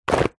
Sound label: wetsuit wet drop ground; foley; zapsplat